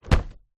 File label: food flour packet large set down on floor